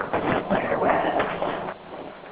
EVP

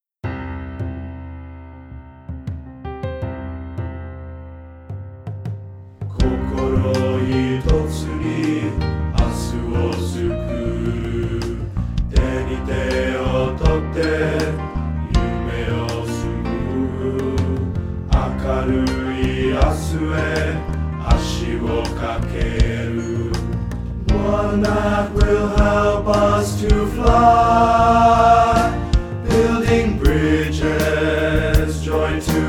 Coke building bass

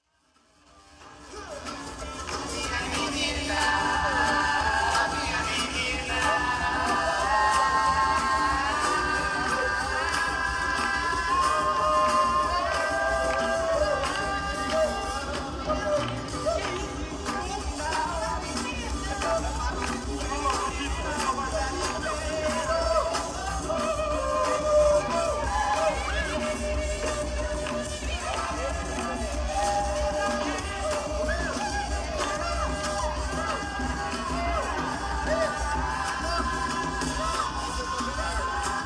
Intro (Samba)

